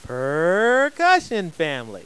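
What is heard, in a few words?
percussion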